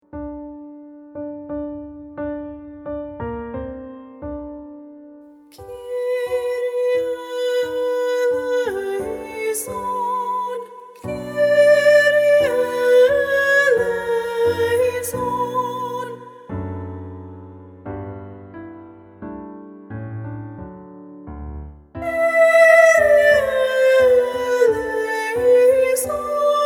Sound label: Soprano Predominant Kyrie Audition